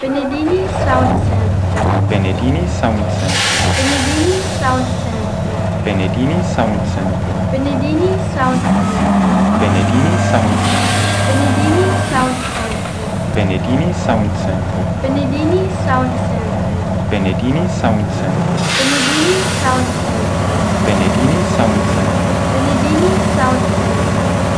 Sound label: Tatra 8x8